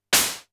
crash, Drum Machines, Formanta Radio Plant Polivoks